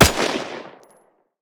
M1 Carbine, Guns Fire; Weapons

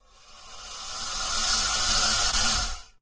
charge lizard; Voices Emotes

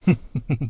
male laugh; Voices Emotes

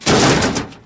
metal break

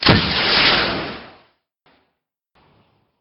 rpg fire, Gunshots; civ13; civ14; Weapons

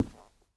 carpet, Footsteps

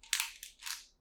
pill insert

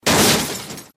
metal glass break

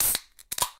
can open

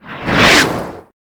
missile, aircraft effects; Vehicles

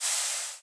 light burn; civ13; civ14

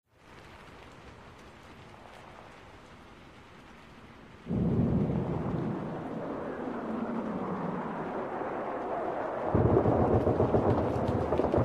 battle, Ambience; civ13; civ14

